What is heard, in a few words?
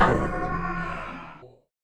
Vox; The Weird Side Samples; 99Sounds